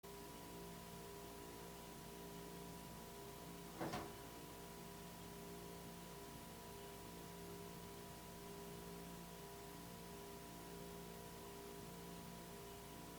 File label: banging noise; EVP; Devoe House